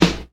round snare sound a sharp key, snare tuned to the A# note. Loudest frequency: 1216Hz